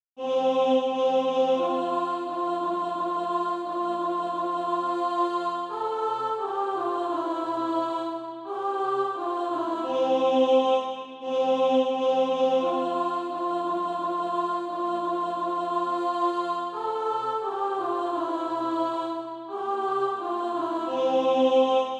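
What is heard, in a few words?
alto, Sheet music (SATB) including Practise Music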